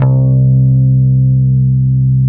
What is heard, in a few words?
bass, Drum & Bass 160 BPM; Ueberschall; Drum & Bass Flash Trackz